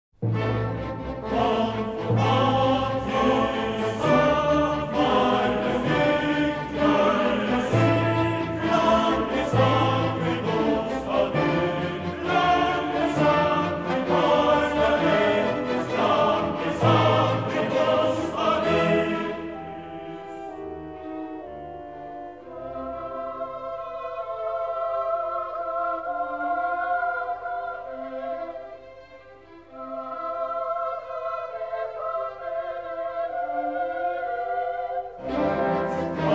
Requiem in D Minor, K 626 VII. Sequentia Confutatis maledictis; Mozart, Wolfgang Amadeus